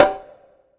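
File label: CONGAS LOW, SR-16 DRUMS; EdgeSounds; Drum Mashines VOL-1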